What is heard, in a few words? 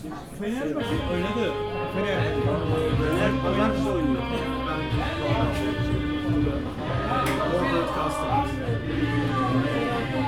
Besiktas match ambiance